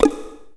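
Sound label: VOX ECHO BELL; Northstar - New Gold VOL-2; RAP CITY 1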